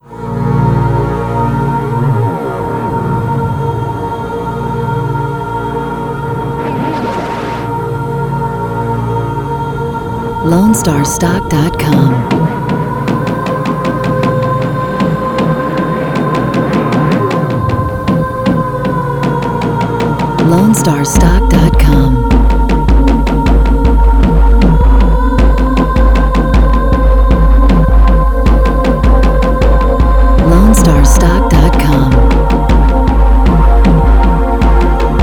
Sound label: VHS Horror